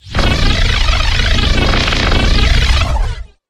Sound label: bullet heavy needler; ufoai; weapons